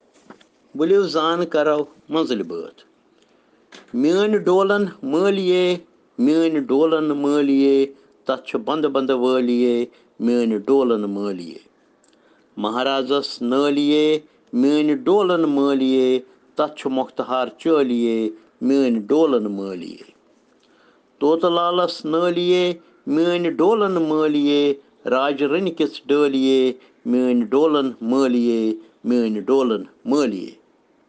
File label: Children Rhyms in Kashmiri